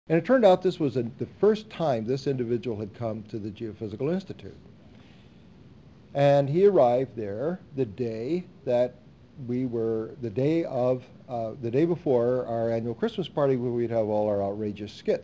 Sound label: string